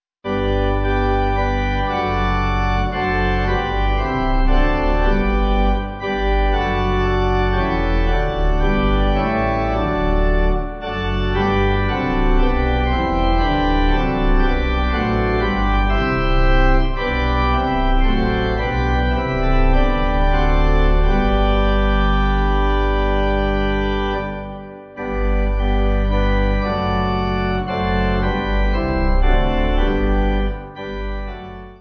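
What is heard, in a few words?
Pipe, Pipe Organ backing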